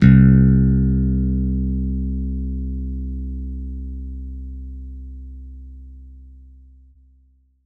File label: Bass Electric Raw C2